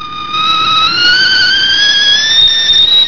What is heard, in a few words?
WHISTLE